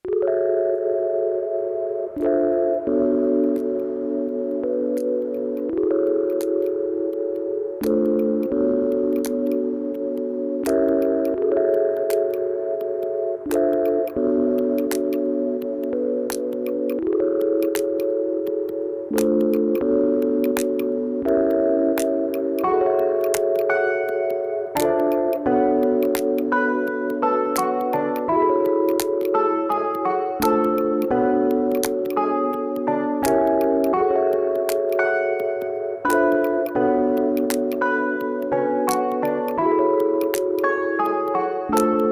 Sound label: Drip